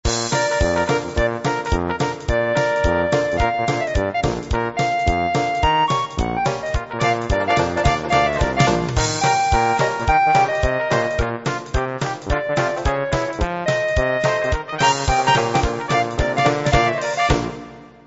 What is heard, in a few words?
dixieland